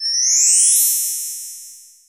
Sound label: Pickup Magic Bells; SFX Kit; Pickups